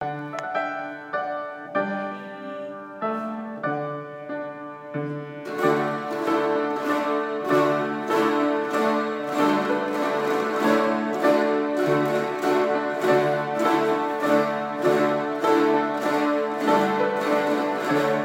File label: dulcimer dulcimer